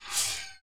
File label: Zoom Chunk; Insanely Twisted Shadow Planet